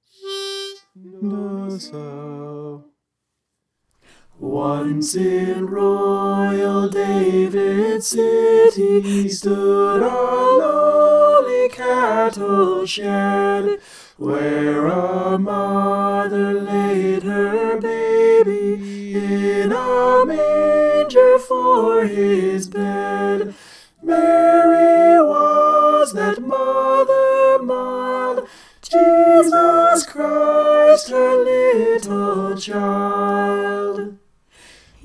once in royal soprano

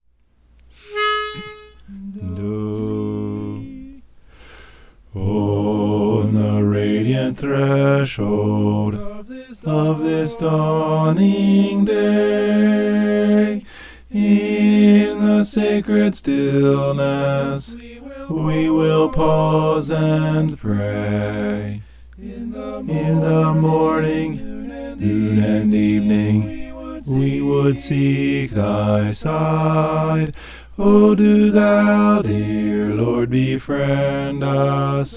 bass

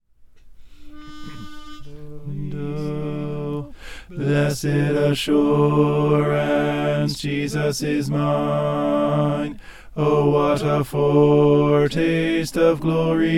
bass; blessed assurance

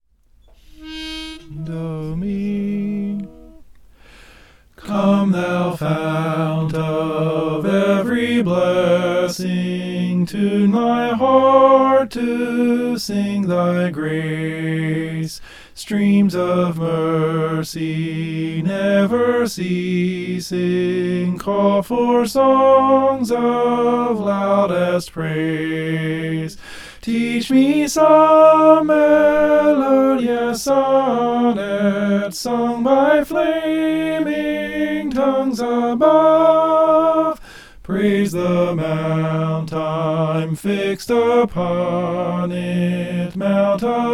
soprano; come thou fount

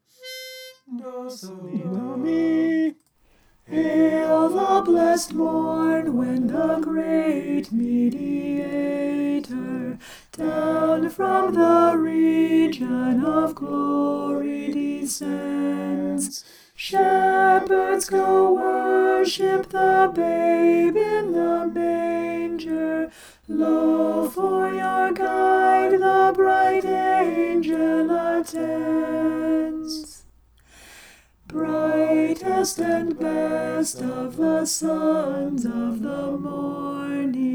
alto

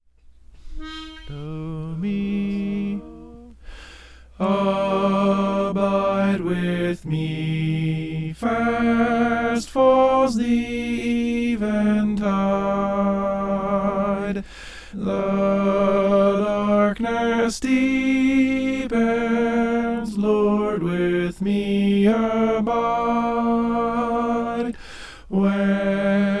soprano

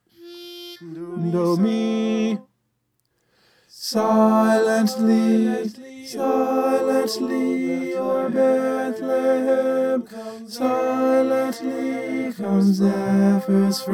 silently oer bethlehem tenor

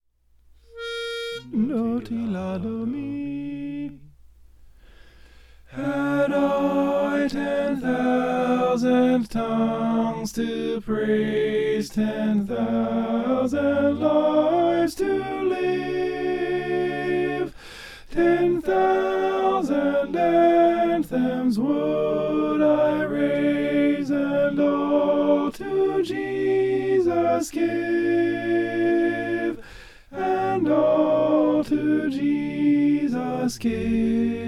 alto; had i ten thousand

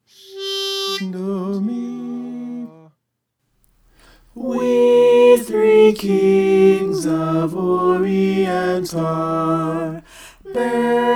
we three kings soprano

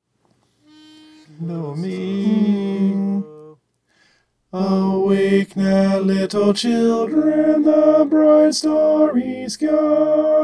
tenor

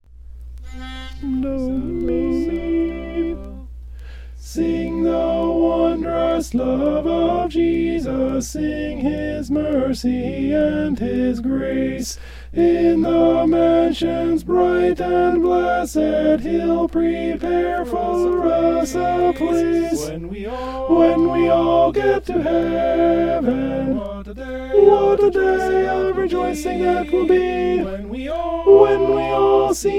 sing the wondrous love alto

